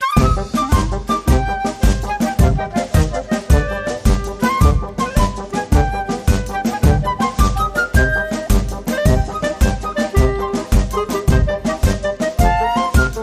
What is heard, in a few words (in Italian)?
Tarantella, Jingle